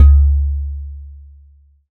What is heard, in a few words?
Udu; resynthèses